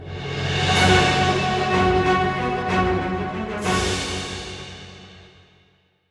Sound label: Stinger